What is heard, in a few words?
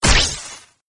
thunder sound